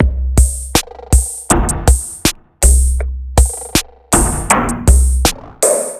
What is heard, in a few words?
Machine Drums; Downbeat